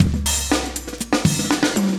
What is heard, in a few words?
chique 120, loops, 120bpm; Sound Effect Library